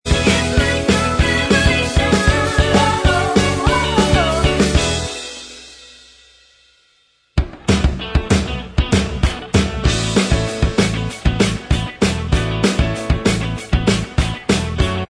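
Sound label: backing vocals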